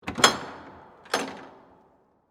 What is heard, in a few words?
Ice Rink Door, Open, Wall, Perspective; Sports; Ice Skating; BlastWave